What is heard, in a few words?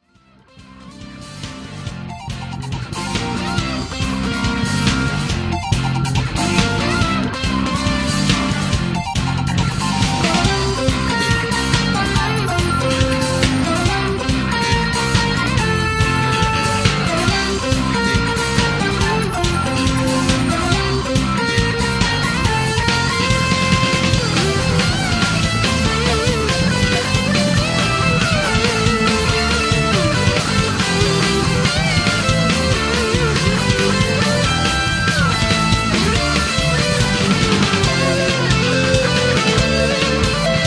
Remix